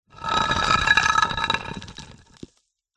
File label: foley heavy stone or rock scrape drag short